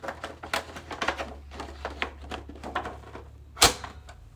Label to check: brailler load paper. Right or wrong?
Right